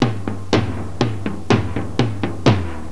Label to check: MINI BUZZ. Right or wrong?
Wrong